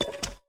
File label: umbrella walk